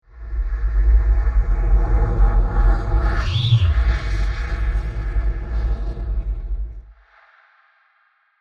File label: Extrem Low Transition With A Short Swoosh Breathy; Scary Soundscape; CraftedSignals